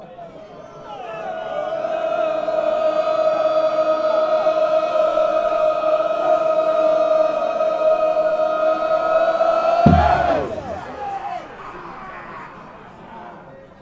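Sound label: goal kick, football fan chants; cufc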